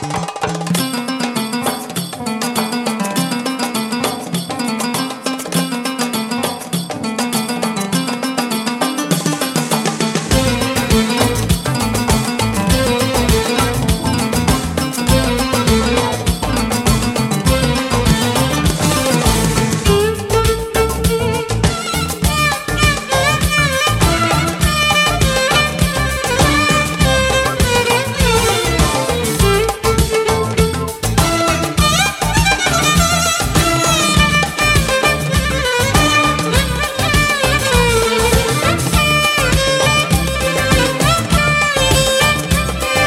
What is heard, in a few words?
Violin